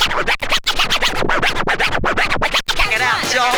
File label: SCRATC; Best Service; Advanced Mega Effects